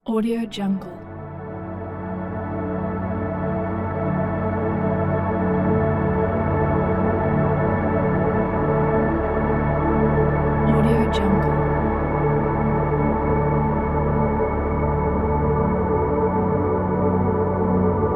peaceful background ambient

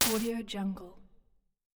scifi interface synthetic button

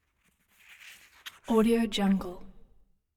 book turning book pages paper movements

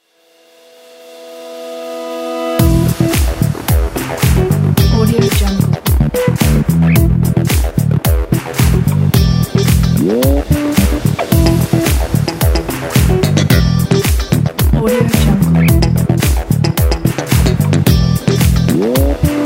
energetic innovation pop

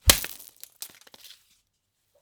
whip crack

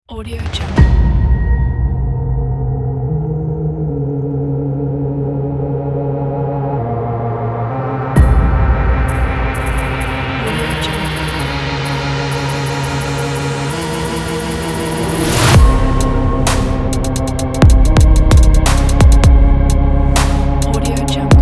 dark cinematic trap

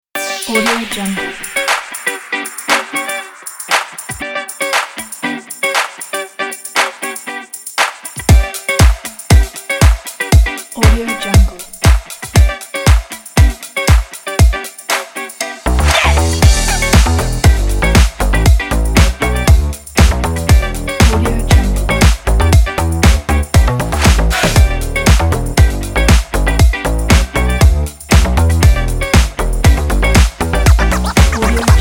upbeat funky

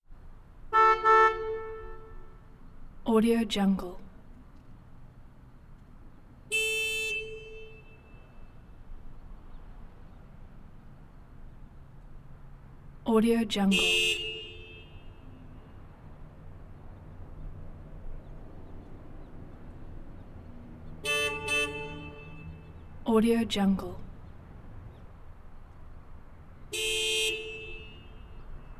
auto horns; prosoundfx

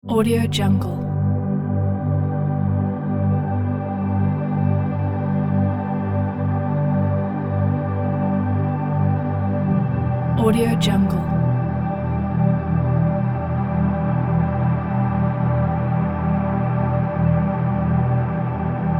atmospheric soundscape

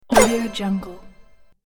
ouch gremlin hit game

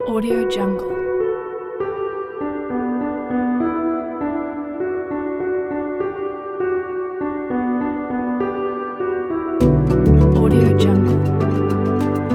inspirational ambient kit

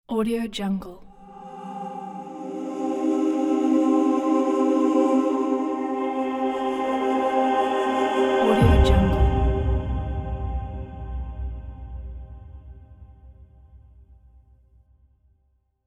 gentle space logo

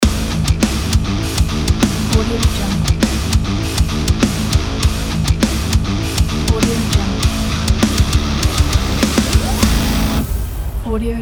the metal logo

